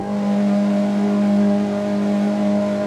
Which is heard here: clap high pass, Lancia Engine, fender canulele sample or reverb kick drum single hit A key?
Lancia Engine